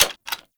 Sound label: SMG Alt Mech; Warframe; Tenno; Sundial SMG; Weapons